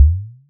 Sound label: Bubble Big